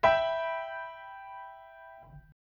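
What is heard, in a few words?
E minor higher, chords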